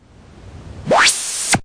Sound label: noise MiniBrute, Noise Sweeps